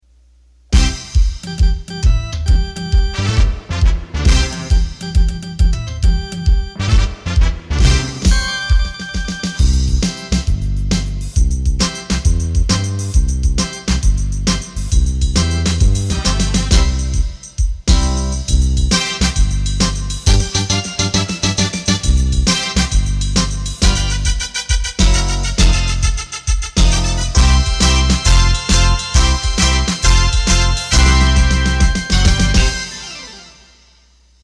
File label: Hully Gully, accordion